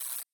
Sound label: error; Risk of Rain